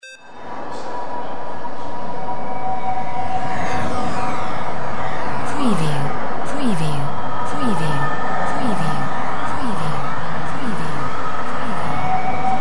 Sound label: AMB CITY TRAFFIC VOX FUTURE